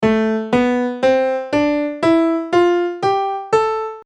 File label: A minor scale